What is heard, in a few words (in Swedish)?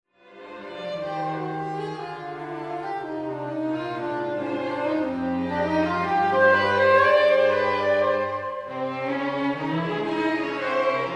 Jazzsvit